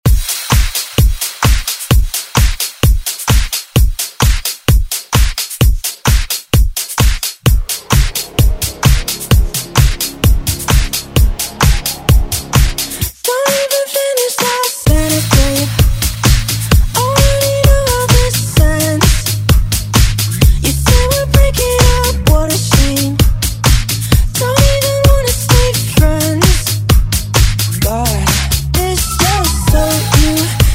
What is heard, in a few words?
Pop Dance Redrum